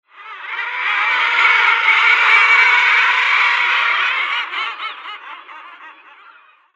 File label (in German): Trottellumme Uria aalge; Vogelstimmen